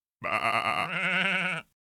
sheep sounds; emerald-crossing

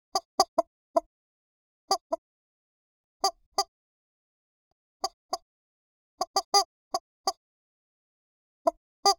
chicken cluck; wonder-junction

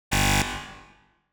Wrong Answer; zoomerang

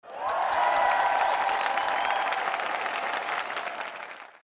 correct fx; time-lab; presentation

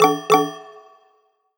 Round Over, sound effects; zoomerang

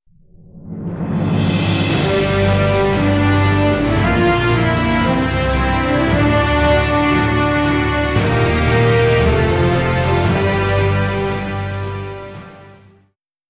intro music; keepers-kingdom

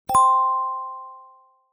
Right Answer, sound effects; zoomerang

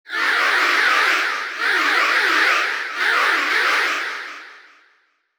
emergency alarm; ocean-commotion

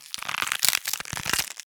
bones cracking; incredible-race

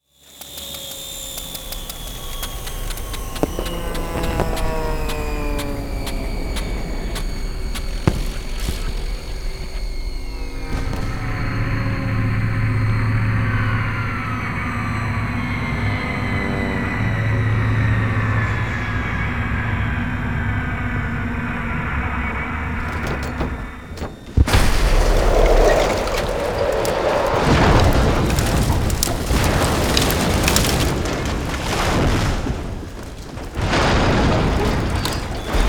aircraft in trouble; incredible-race

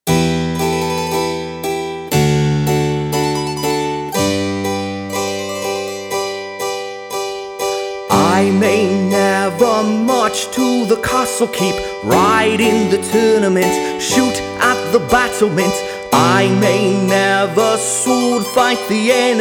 medieval style; keepers kingdom

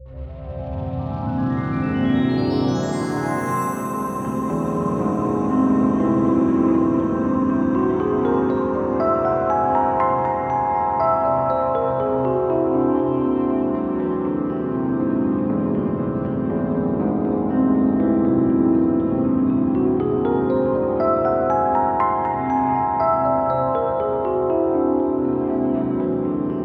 Dream Music; ocean-commotion

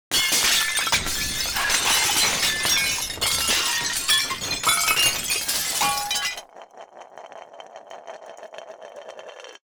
dishes crashing big; mystery-island

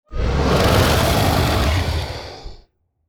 roar big; keepers-kingdom

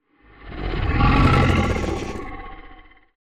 growl big; keepers-kingdom

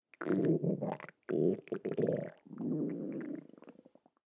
stomach growling; jungle-journey

